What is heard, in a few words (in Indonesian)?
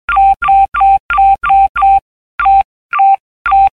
batre lemah, ringtone; nada dering SMS dan Telepon